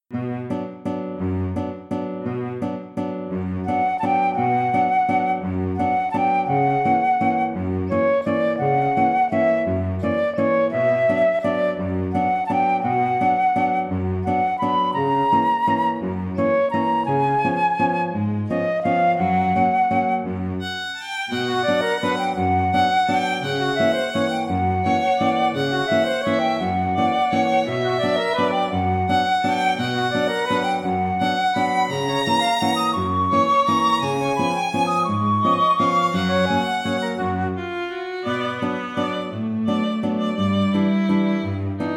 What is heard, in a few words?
Quartet